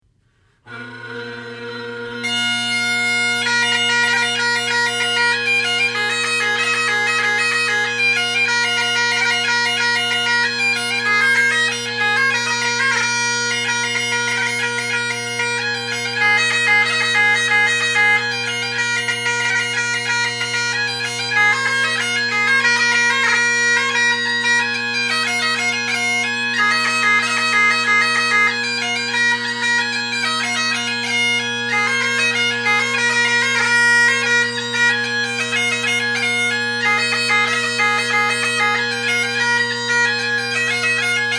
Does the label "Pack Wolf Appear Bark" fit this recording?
No